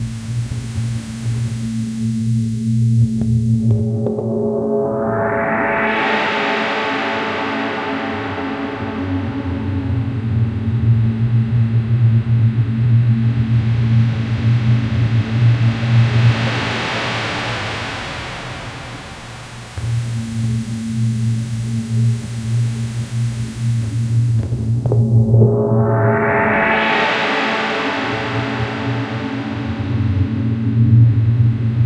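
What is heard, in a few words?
a balanced rhythmic mind m, hypnosis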